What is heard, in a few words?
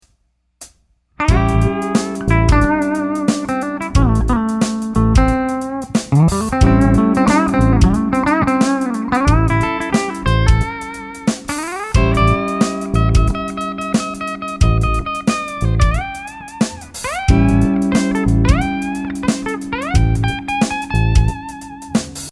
goodfeeling solo, guitar